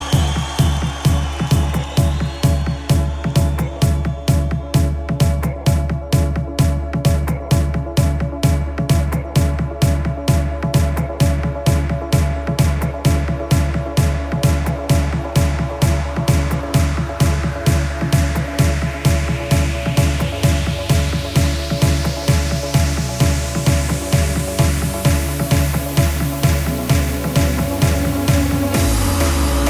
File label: remix